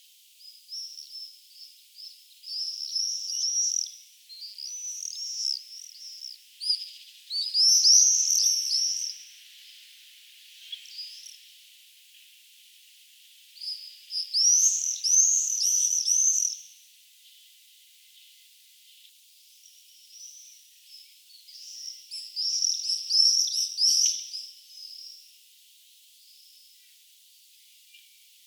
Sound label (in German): mauersegler; vogelstimmen; nabu; lars lachmann